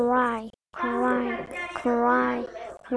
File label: cry